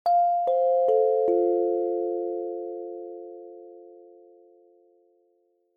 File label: Chime Announce